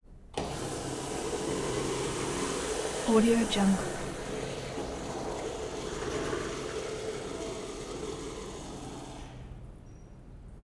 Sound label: toilet flush water gurgle and spray drips